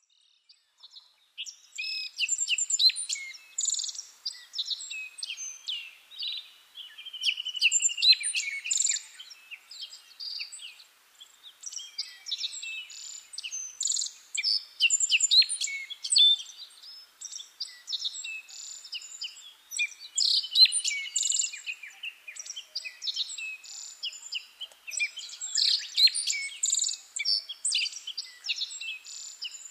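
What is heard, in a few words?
aoji, black-faced bunting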